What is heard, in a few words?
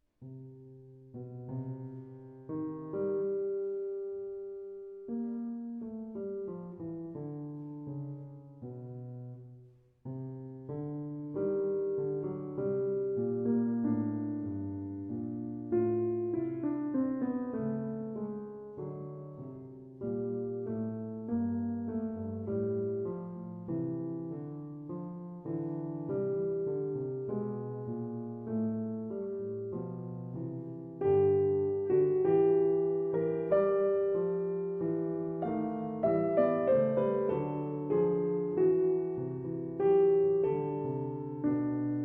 Fugue in C Minor, Three-Voices Exposition; Completed by A. Simonetto